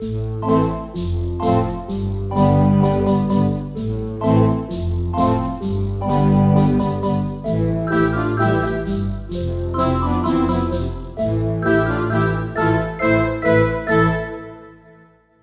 Steam Powered Synth; KORG OASYS; oasys assault

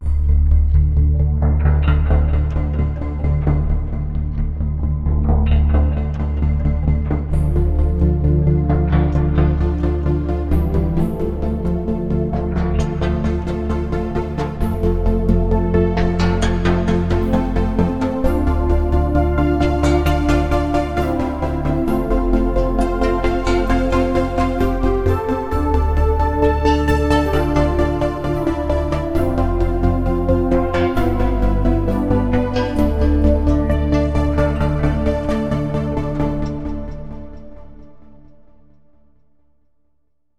Wavestation Pad Block; KORG OASYS; oasys assault

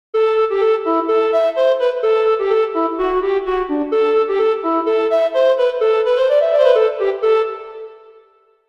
Low D Irish Flute; KORG OASYS; oasys assault

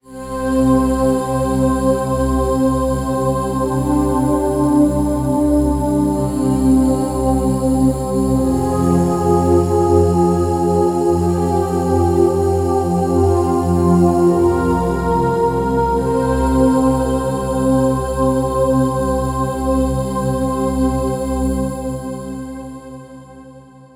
Whisper 2 Me; oasys assault

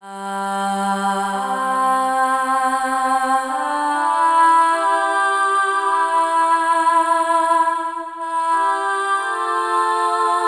Aaaahh; KORG OASYS; oasys assault

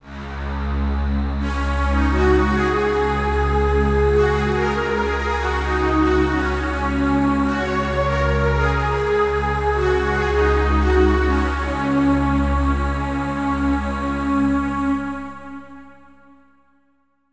Silk Voices; oasys assault

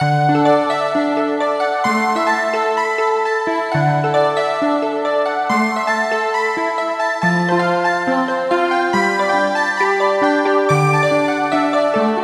AHHH Voc EP Piano; KORG OASYS

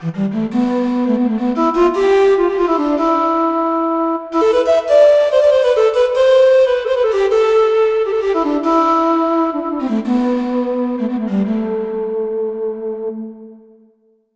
Low D Irish Flute; KORG OASYS; oasys assault

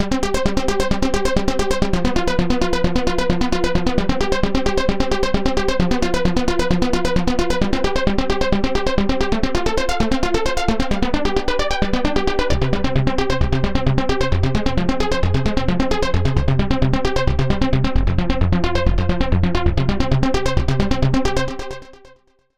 Arpeg Euro, synth; KORG OASYS; oasys assault

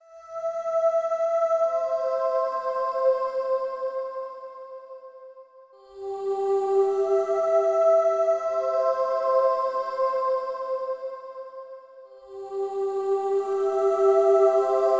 Angels Voice; oasys assault

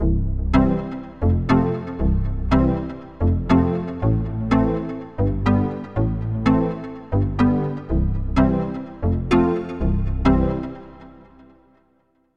FM Touch; oasys assault

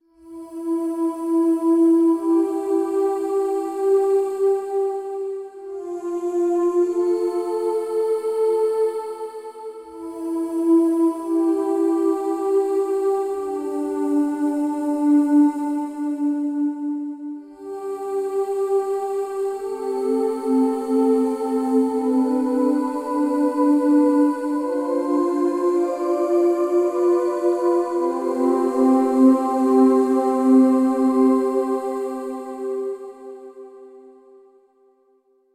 Angels Voice; oasys assault

